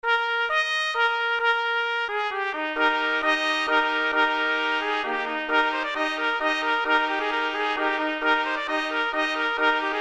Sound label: 4 trumpets audio; intermediate trumpet quartet; Galliard Battaglia